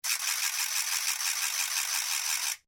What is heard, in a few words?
NOISEMAKER WOODEN SPIN LONG, Cheap percussion toys